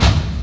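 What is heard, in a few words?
percussion fx; Best Service Dance Mega Drums; PERC-FX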